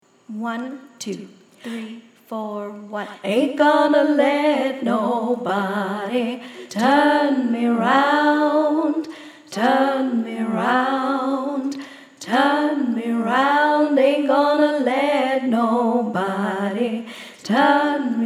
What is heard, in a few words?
low melody; ain t gonna let nobody turn me round